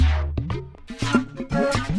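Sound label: Music Base 120; From Dust; ps3; xbox 360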